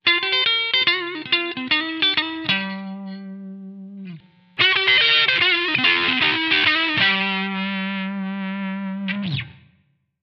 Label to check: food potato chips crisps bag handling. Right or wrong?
Wrong